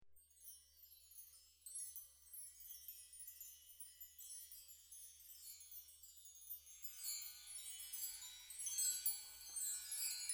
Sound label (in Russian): ВЕТРЯНЫЕ КОЛОКОЛА; Фен Шуй